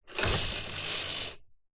household blind shade pull cord room slight distance; zapsplat